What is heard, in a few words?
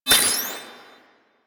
Puzzle Symbol Switch UI; Venus Kahl; Warframe; Quests; Veilbreaker